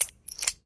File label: mosin reload, guns